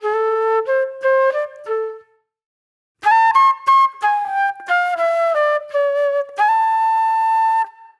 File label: Reflective Flute; Sonic Foundry (Sony Creative Software); Manhattan Flutes